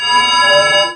WARN; eCS 1.00; MMOS2

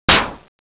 TONK; OS2 Warp Connect; MMPM2

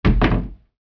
DOOR SHUT; Microsoft Office 95 Professional; VALUPACK

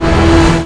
desktop drop; eCS 1.00; MMOS2

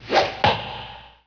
ARROW; Microsoft Office 95 Professional; VALUPACK

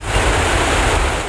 OCEAN DRAG; eCS 1.00; MMOS2

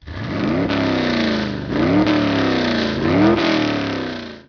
HOTROD; Microsoft Office 95 Professional; VALUPACK

